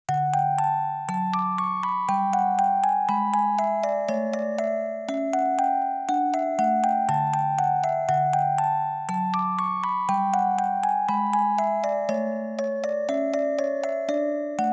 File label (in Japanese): 夢のオルゴール; sengoku turb original sound track 1999